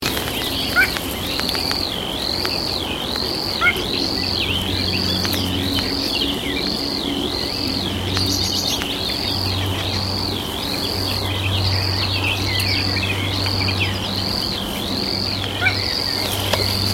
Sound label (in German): rohrschwirl